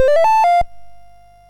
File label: sid, MOS6581R2; Donkey Kong 1983